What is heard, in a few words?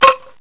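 angklung; Sounds Terrific II; Weird Science; fareast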